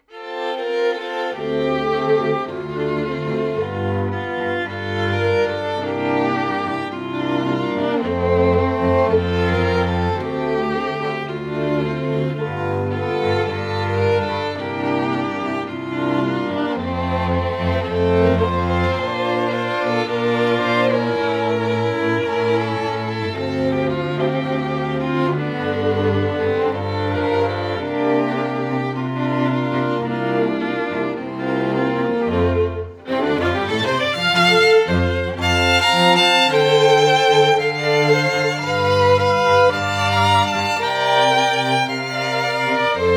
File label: strings